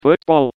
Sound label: battle cry; John Madden